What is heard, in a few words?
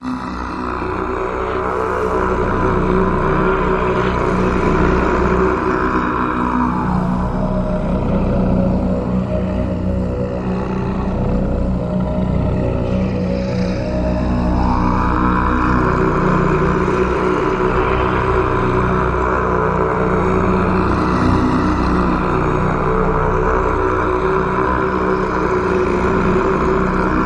Emperors Call Drone